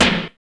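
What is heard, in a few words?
SD, snare drum; X-Static Goldmine 1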